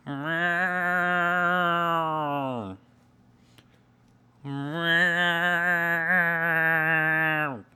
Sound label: Cow